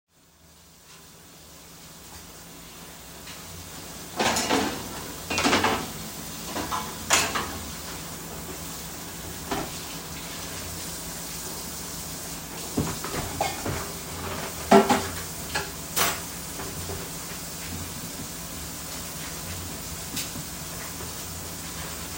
Dishes; Field Recording

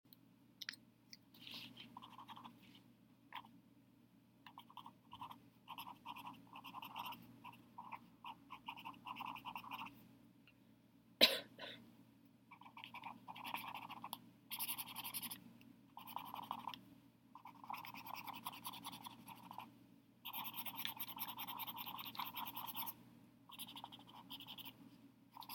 Writing

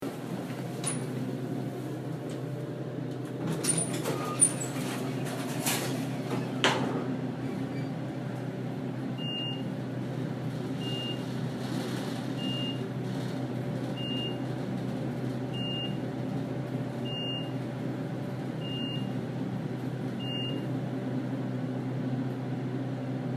Elevator

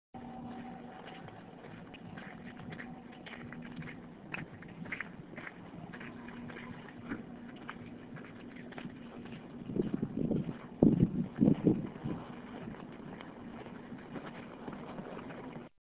Walking

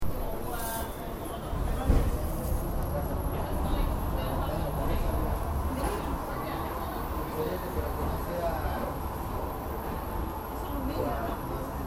train; Location and Date: D train to Penn Station, 3/3/18, 9:25pm

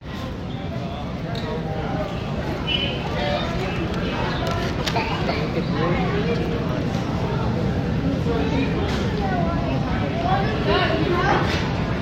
North Terminal

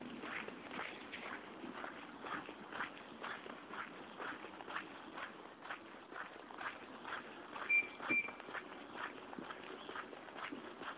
SNOW CRUNCH; January 29, 2014, 11:20am, Unispan

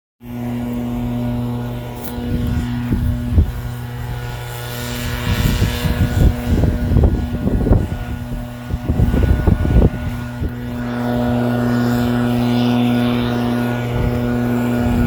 The Rapturing Roar of a Leafblower

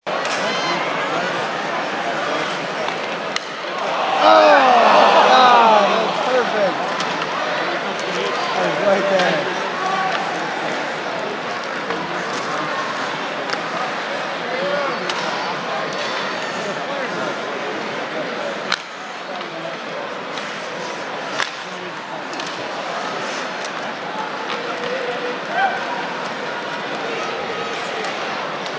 Hockey; Flyers/Islanders game, Flyers shot on net, pucks flying, crowd amped.

